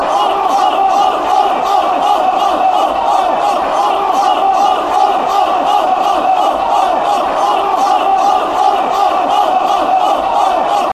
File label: off off off, fan chants